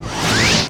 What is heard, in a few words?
feedback; starcraft; protoss; dark archon